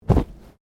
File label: Body movement Fall Medium